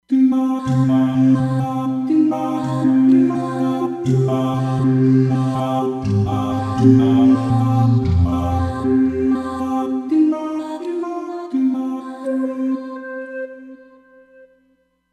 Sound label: Beat Vox, Roland Fantom XR